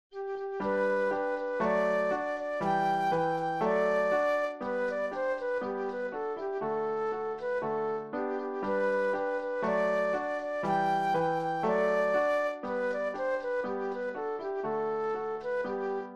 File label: Ecossaise Flute Solo; classical; Beethoven; WoO 86